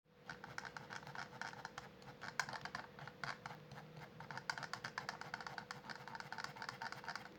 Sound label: Razer Scroll